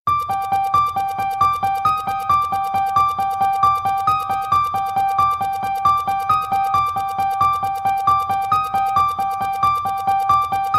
halloween horror

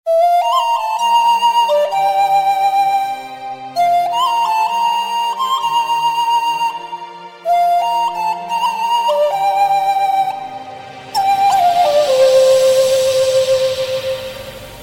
fly

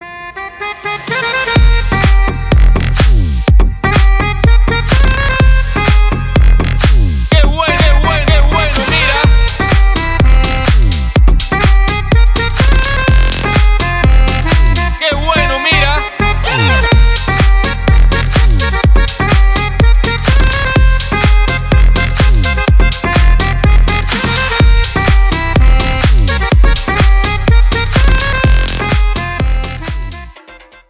accordion

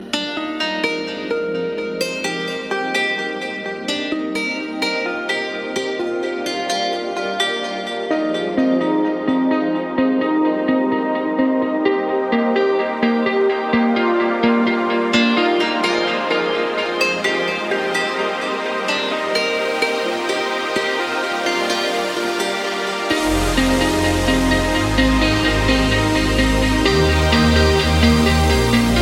trance

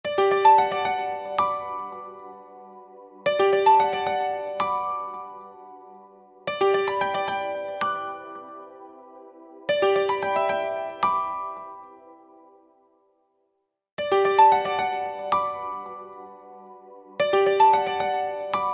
lg bloom

